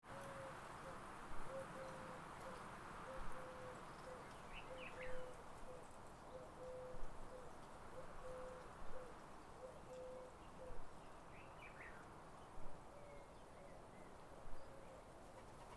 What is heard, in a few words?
country wind birds rooster pigeo; atmosphere